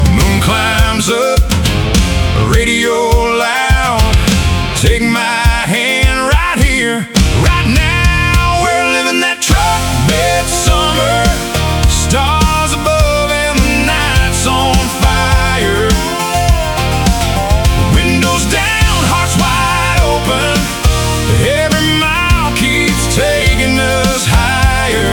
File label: summer country song